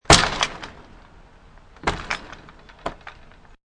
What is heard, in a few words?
An old screen door is banged shut and then bounces and shuts lightly ag; Screen Doors; An old screen door is banged shut and then bounces and shuts lightly again.